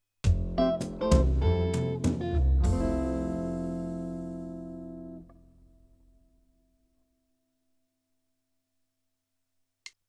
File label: Clave Single Beat